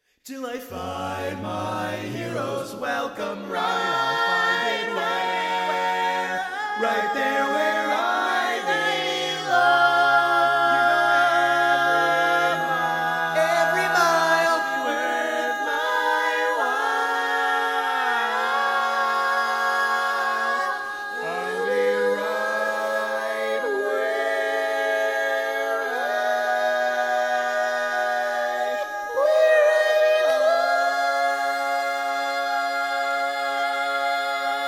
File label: Tenor, barbershop tag